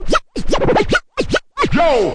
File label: turntable yo, scratching and turntablism; DRUM LOOPS and BREAKS